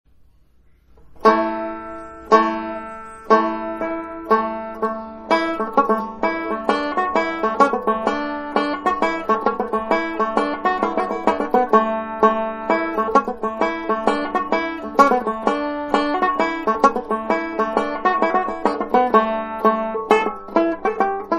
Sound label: katie scollards slide